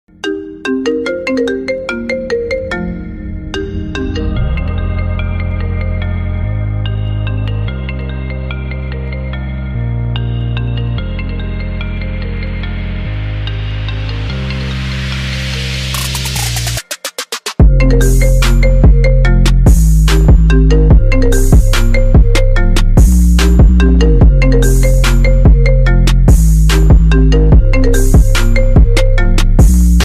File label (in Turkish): Telefon Zil Sesleri, IPhone 8 Plus Remix